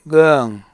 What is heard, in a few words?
hard scales